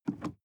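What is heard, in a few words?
sports car interior pedal gas step and release; autos; verkehr transport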